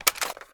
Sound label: ar reload gl mag out; weapons; gmod; viper; scharlie